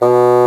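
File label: Bassoon B0; Digital Sound Factory Virtuoso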